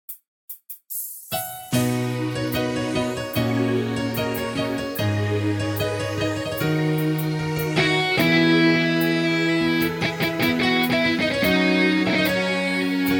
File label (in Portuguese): teclado playback